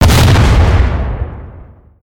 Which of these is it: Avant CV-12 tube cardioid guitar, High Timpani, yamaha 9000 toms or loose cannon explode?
loose cannon explode